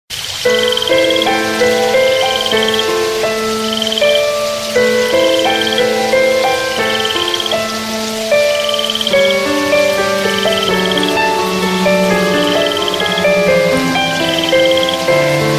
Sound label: note 2 picnic ringtone